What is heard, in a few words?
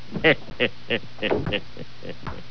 hehe